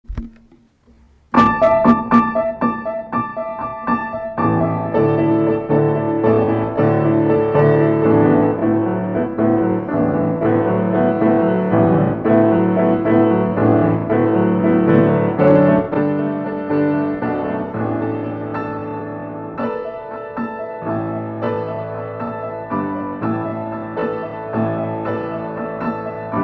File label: accompaniment slow; gerakina